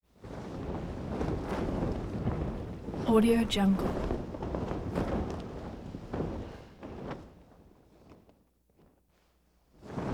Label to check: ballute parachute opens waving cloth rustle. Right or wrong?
Right